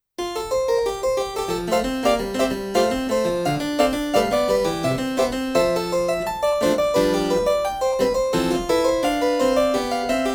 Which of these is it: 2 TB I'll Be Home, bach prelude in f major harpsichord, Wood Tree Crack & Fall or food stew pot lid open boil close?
bach prelude in f major harpsichord